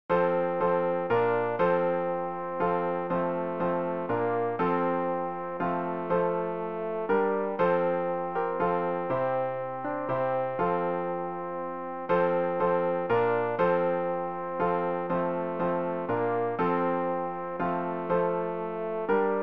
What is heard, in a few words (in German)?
tenor; Ref. Gesangbuch; RG 652: In dir ist Freude